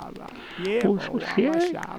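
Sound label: Music Village Calm Inside; From Dust; ps3; xbox 360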